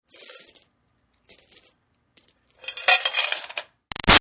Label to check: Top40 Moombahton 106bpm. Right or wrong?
Wrong